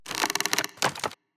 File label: tree golem big strike; Shelter